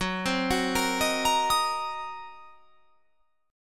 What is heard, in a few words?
Fsharp 6th Flat 5th down, chord